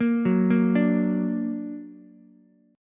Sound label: D Augmented, D, down, Ukulele; arpeggiated; JGuitar